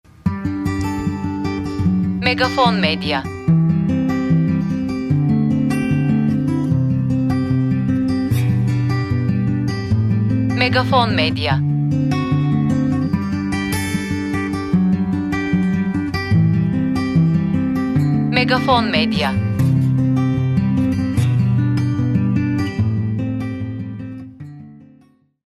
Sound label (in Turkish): SANTRAL MÜZİK